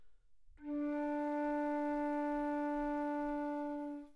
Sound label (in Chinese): G调新笛 筒音 自然衰减 力度中强